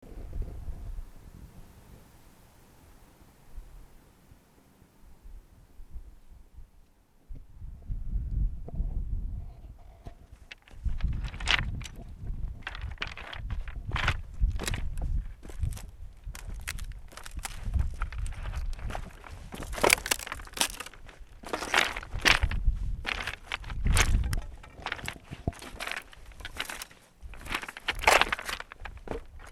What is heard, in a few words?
Field Recorder; Easternshores